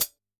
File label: Pd Hat, drum